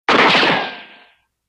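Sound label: Pistol Interior Shot Ricochet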